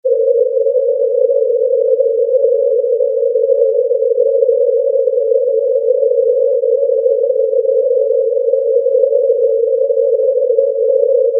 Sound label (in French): bruit rose